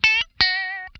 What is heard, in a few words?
lick scale, funk guitar; Zero G - Funk Guitar